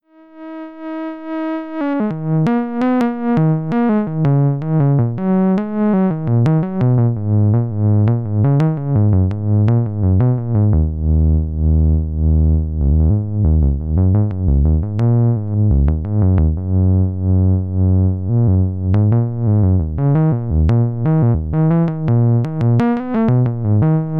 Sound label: ARP2600 VC Panorama